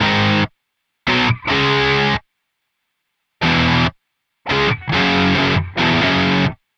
Distorted Guitar 140BPM G#min; Distorted Guitars; Vengeance - Essential Clubsounds Vol.4; Guitarloops

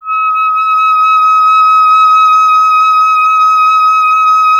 clarinet D#; Miroslav Vitous; Solo Instruments VOL-1

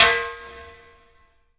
SNAR, 3-D Percussives; E-MU Producer Series Vol. 5 – 3-D Audio Collection; P.A. Kit

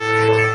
caution, signals; AKAI; FX SIGNALS

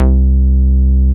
SNGL.BASS, Jungle Rave

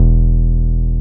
303 SUB E2M, SUB BASS

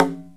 CONGA SET; OMI - Universe of Sounds

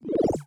sine wobble, bass

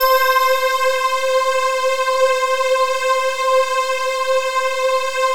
SY99 ELEGA, BELLS; Best Service; Alpine Volksmusik

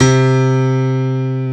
PIANO, Pianos Mixe

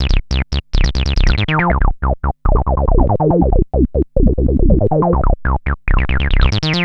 Analoop, Industrial Analog

